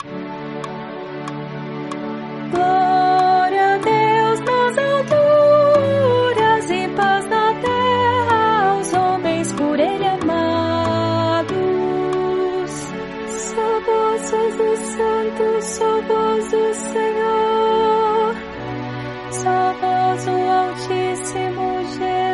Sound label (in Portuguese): soprano